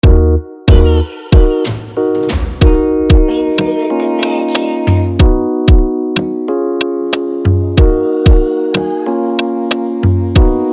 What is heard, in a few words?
Afrobeat Instrumental; type beat